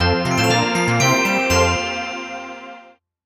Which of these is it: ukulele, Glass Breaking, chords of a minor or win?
win